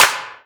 Main Clap Reverb

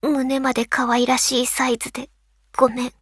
applause; osu

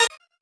normal hit normal

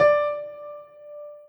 piano

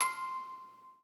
musicbox

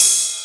drum hit clap

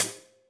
drum hit whistle

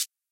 drum hit normal

